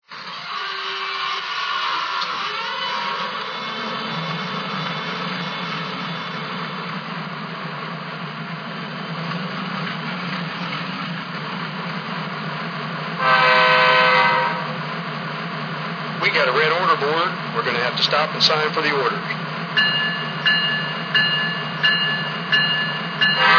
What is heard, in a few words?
u25b; chs